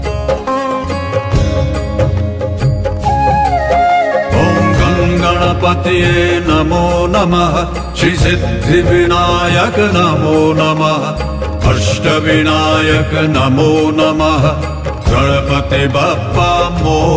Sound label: Shree Siddhivinayak Mantra And Aarti